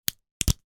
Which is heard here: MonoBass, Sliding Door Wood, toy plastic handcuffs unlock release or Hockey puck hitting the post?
toy plastic handcuffs unlock release